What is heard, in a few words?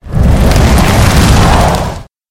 monster holy flame